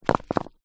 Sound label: rock small roll concrete ground; foley